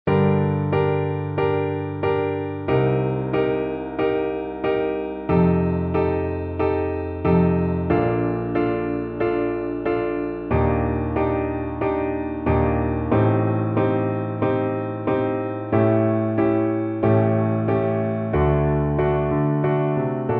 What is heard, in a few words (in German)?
Ave Maria, Fagott Solo + Klavier-Playback